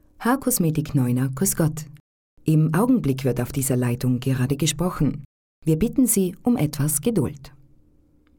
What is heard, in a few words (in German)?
audio jingle; neuner